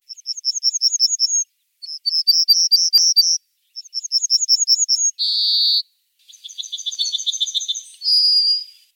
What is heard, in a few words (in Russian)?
овсянка отличия; белошапочной; Изучаем песню овсянки обыкновенной